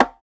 perc; FL Studio; slayerx drumkit

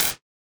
clap; goodtakimu drumkit; fl studio; drumkits

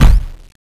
kick; goodtakimu drumkit; fl studio; drumkits

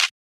clap; goodtakimu drumkit; fl studio; drumkits

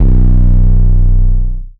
808 (B); drumkits; fl studio; bvker drumkit; Born 808

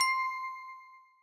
Bell; FL Studio; young kico drumkit

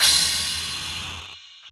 crash; goodtakimu drumkit; fl studio; drumkits

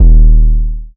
808 (D#), 808s; drumkits; fl studio; bvker drumkit; Born 808

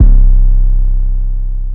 808 high end; drumkits; spinz; fl studio; slayerx drumkit

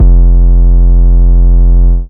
808 (F); drumkits; fl studio; bvker drumkit; Born 808

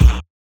kick; goodtakimu drumkit; fl studio; drumkits

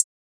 Hi-Hat; drumkits; Hi-Hats; fl studio; young kico drumkit; Chronicles Of The Atlantic Trap (Sound Kit)

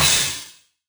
crash; goodtakimu drumkit; fl studio; drumkits

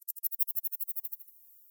hh roll fx; drumkits; fl studio; goodtakimu drumkit

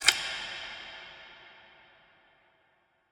Perc

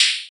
Open Hat, Cymbals

